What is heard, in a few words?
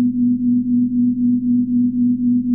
brumbrum